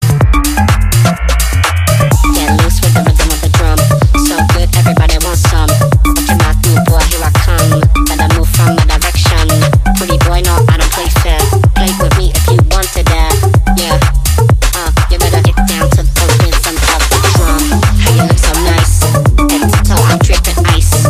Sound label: electro rhythm of the drum